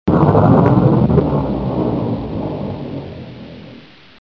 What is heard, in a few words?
explode